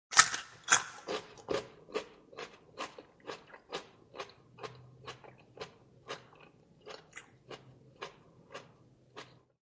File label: Biting and chewing a fresh piece of carrot.; Eating; Human